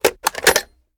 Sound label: reload mag out; reloads; gmod